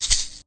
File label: shake